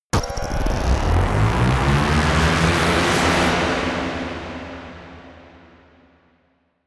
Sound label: reverb rumble, risers